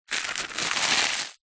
toast squash crush

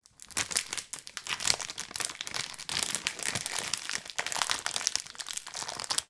large packet of chocolate movement in hand

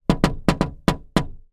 household door knocks thin glass wood multiple; zapsplat

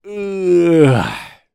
human male 37 years old yawn

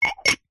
household ceramic ramekins x2 stack

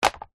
sport flipper fin drop light on ground

